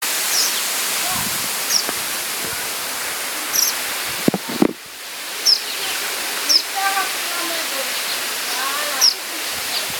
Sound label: Mascarene martin